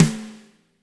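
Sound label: fat snare right; Spectrasonics; Burning Grooves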